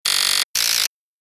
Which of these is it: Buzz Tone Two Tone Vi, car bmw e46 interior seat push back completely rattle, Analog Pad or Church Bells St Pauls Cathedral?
Buzz Tone Two Tone Vi